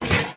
item shovel pickup

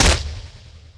Attack; muscle pepper

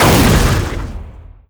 explosion normal; weapons; cow mangler; tf2

